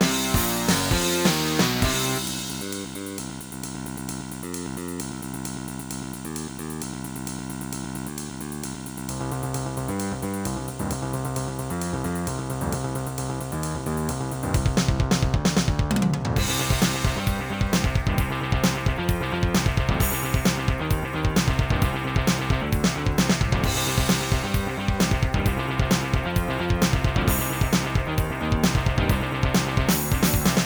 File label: LG MIDI ART GS1000R; sigil doom episode 5